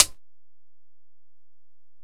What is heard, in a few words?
Hit, Closed Hats